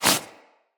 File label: Quick Foley; Characters